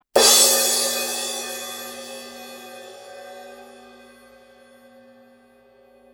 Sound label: med crash 16 hit, cymbal; sabian; b8pro